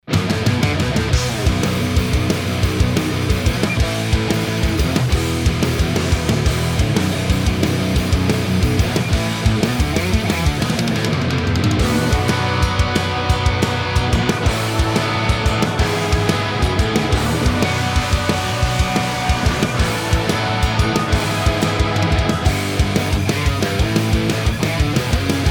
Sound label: MIX MESA REAL